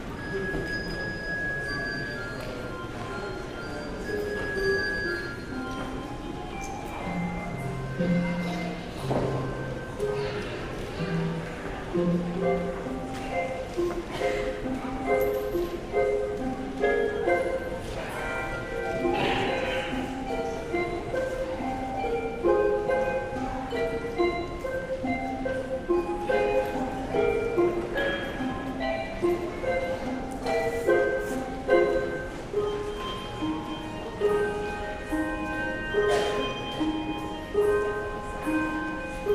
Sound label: Handbells; The King of Glory